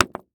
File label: Impact Rock Hit Concrete